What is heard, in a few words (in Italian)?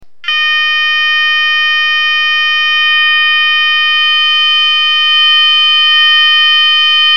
EVACUAZIONE 610HZ